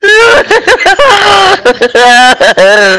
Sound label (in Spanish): jajaja